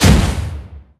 Mage Meteorshower Impact, mage meteor shower impact